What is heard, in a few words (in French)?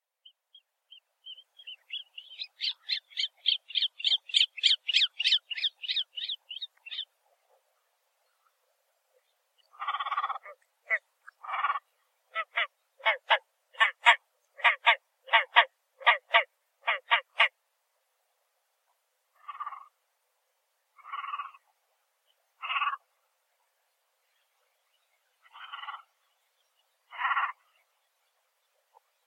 Grebe huppe Podiceps cristatus; Natura 2000